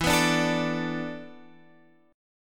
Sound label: E 7th Suspended 4th